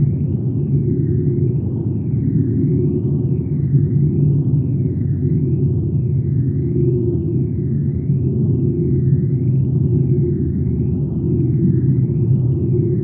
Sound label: ambient battleship hum; Stellaris